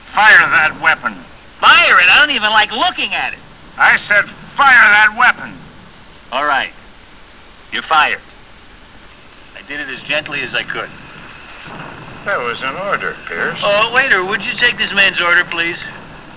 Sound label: get gun fire gun